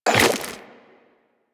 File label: Run Goo, Footsteps; Warframe; Tenno